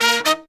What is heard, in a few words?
120BPM, Horns